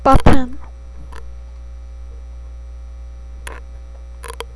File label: button